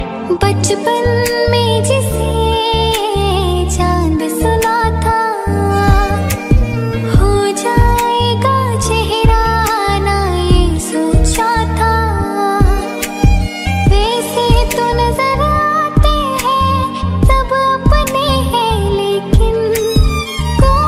Most Sad Song Ringtone

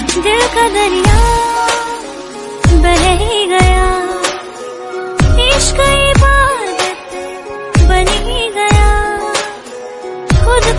Female Song Ringtone

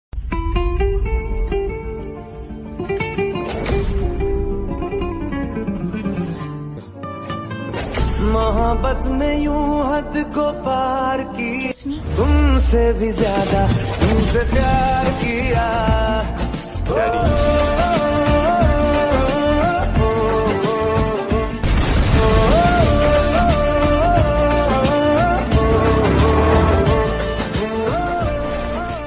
Hindi Song

